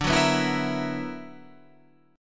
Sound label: B Minor Major 11th D, chord